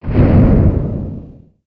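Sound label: Collision Interior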